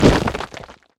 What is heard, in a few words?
Toss cast